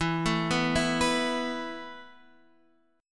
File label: E Suspended 4th E down Guitar Half Step Down